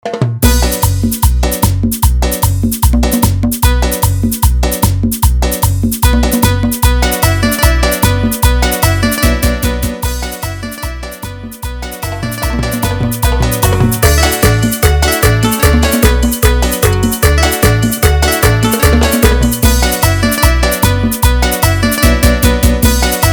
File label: Instrumental BPM 150